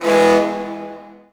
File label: foghorn; Boston